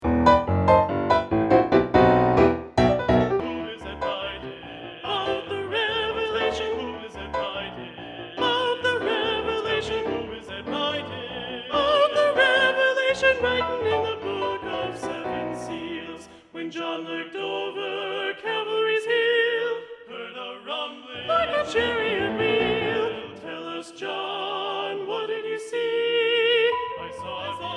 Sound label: Soprano 2 Predominant, choir; Soprano 1; advanced SATB honor choir; Practice Track